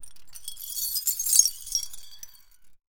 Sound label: meditation